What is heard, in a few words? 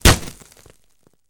Bullet Hits Glass Med